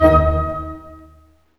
HIT STACO, Staccato Oct; String Master Series; Dynamic Orch

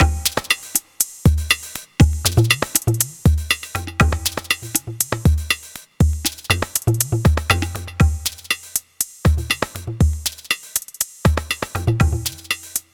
Tablatron, Processed Percussion

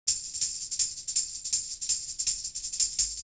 Live Perc Riffs; Jungle Frenzy 1

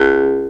C# KICK Kick; Big Fish Audio - Didgeridoo and Other Primitive Instruments; JAW SIMPLE

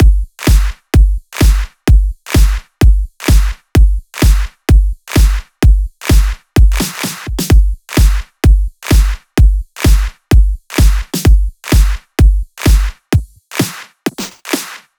Loops 128BPM, Drumloops

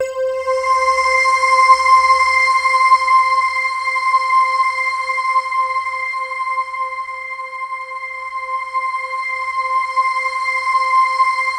SNOWFALL, MORPHEUS

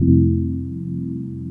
CHORD, Drum & Bass, 160 BPM; Ueberschall; Drum & Bass Resonance

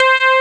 LEAD

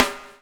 BUBL, BUBBLE; Spectrasonics - Backbeat

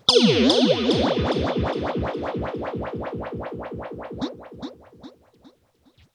RIPPLES R, Funk Guitar; 90 s Sample CDs; Zero G

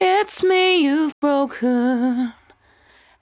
74-5bpm B, Velvet Vocal; While U Could; Zero-G

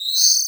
LI L CHIRP; Distored Reality

